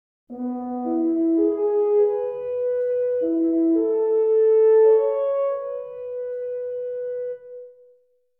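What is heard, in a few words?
horn passage velocity; expression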